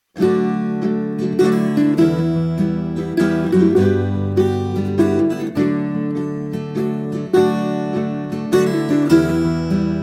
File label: loop